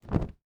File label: Cloth Drop Blanket On Cement; household